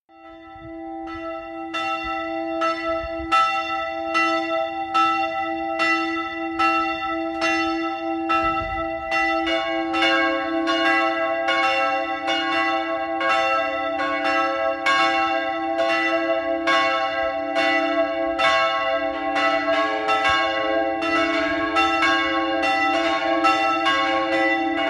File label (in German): Glocken